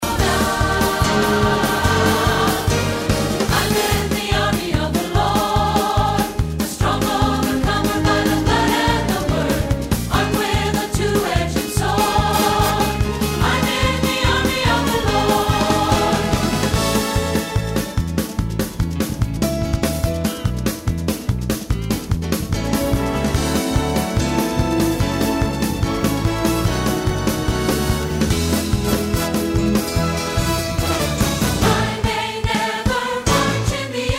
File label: Melody